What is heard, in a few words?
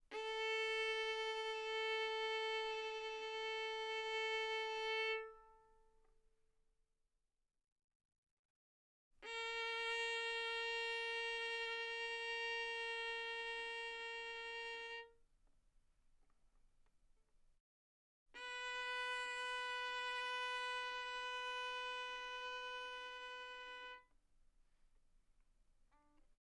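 Violin arco mezzo forte sul A A4 B4; Strings; violin2012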